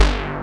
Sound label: FATTY BASS, Synthsonic; Pro-Rec - Synthsonic; BASSES